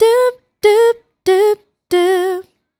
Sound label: Female Vocal